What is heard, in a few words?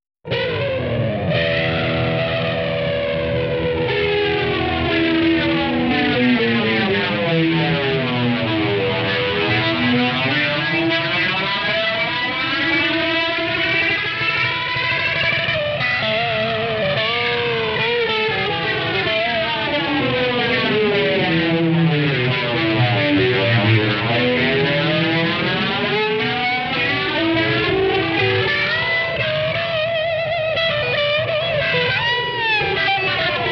space driving; Maxon VJR9